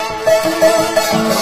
dobro danger; music; Left 4 Dead 2; Source Engine; zombat

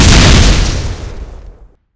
rocket direct hit explode; tf

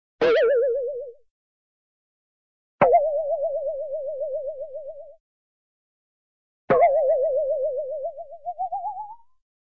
twang, cartoon saw blade twang

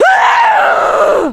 female mad scream, witch voice

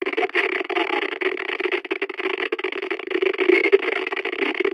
radio static; video games; train; source engine; portal2

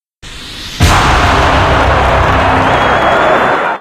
railgun double kill; video games; sniper; source engine; tf

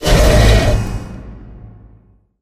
piston start

